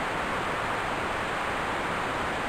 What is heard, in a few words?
TV Static; zps; Ambient